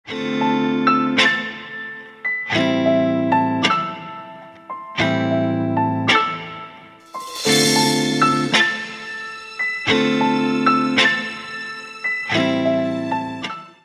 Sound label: message alert ringtone